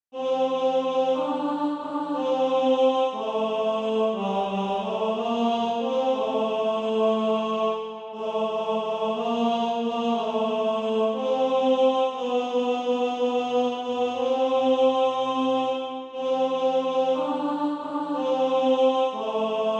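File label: tenor, Practise Music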